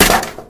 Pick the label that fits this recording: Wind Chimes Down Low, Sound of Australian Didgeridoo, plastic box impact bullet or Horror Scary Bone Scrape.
plastic box impact bullet